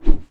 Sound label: Woosh Ukulele